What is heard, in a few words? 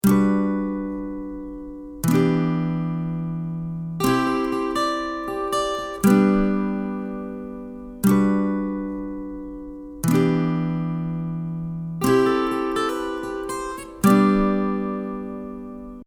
Guitar